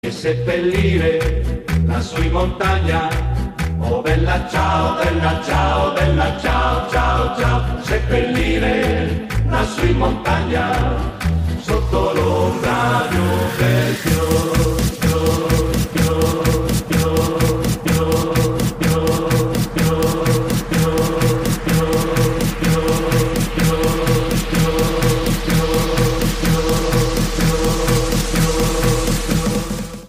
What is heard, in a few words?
Bella ciao remix